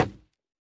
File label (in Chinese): 竹木破坏声